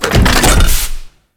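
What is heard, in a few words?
Crane Move Horizontal Start; Venus Open World; Objective Rooms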